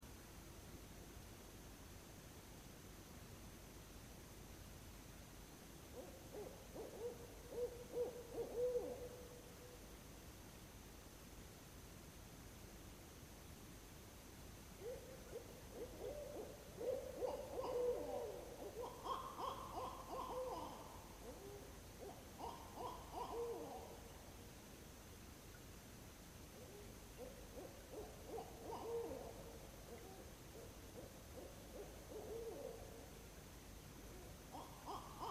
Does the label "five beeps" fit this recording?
No